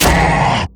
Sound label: nether strike out; spirit breaker